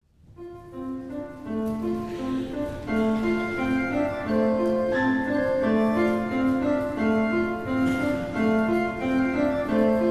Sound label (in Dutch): instrumentaal ding dong merilly on high; J. Tabourot, H. Veldman; CD Kerstconcert 2014